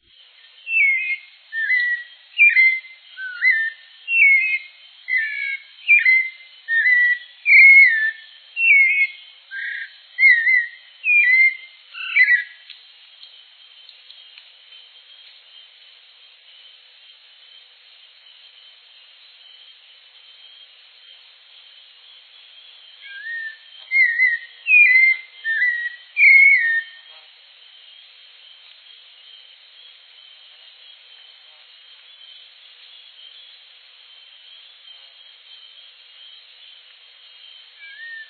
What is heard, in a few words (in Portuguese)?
sabia coleira